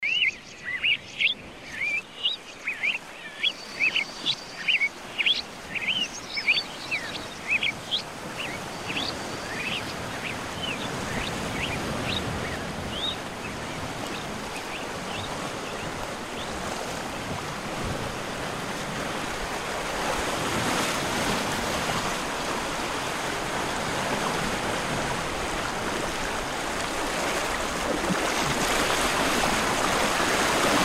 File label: Ocean Waves Reversed; Normalized